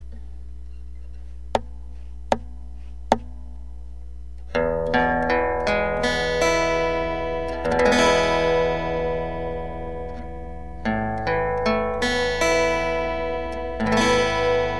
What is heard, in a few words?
piezo, acpickup; ピエゾ